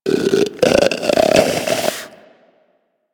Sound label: Predator Snore; Warframe; Hunting Critters